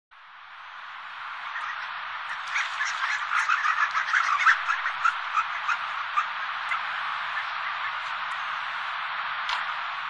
Histrionicus histrionicus; Sakhalin; Kuril; sea; priroda sakhalina i kuril

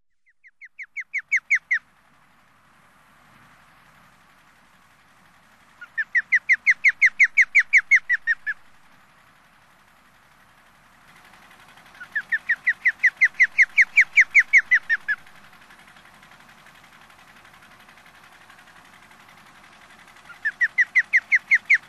Pandion haliaetus; Sakhalin; Kuril; sea; priroda sakhalina i kuril

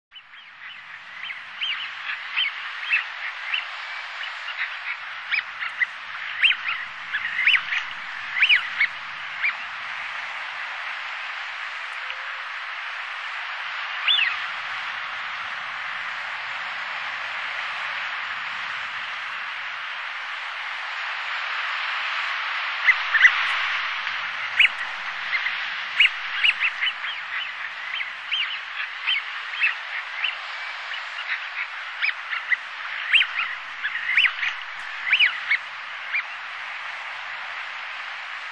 Anas penelope; Sakhalin; Kuril; sea; priroda sakhalina i kuril

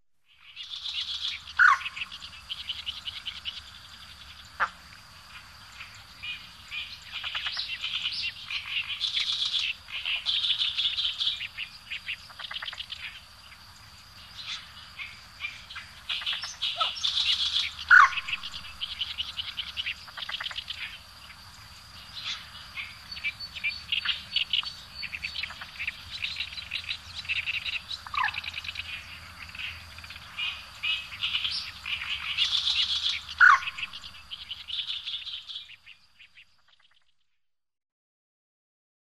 Gallinula chloropus; Sakhalin; Kuril; sea; priroda sakhalina i kuril

